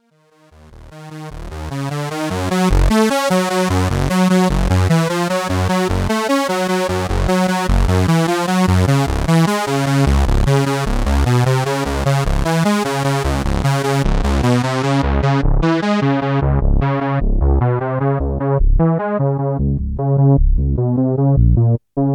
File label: Hydrasynth Unison